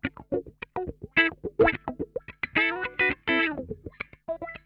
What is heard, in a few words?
LICK, Funk Guitar; 90 s Sample CDs; Zero G